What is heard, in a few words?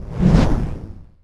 woosh; 12zi cow boss